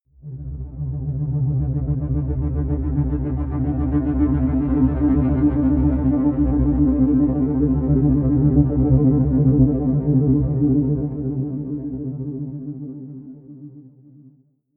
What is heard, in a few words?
synth trill, drones; musikelemente